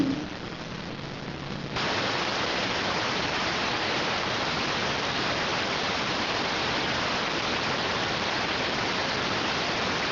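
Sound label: radioteletype receive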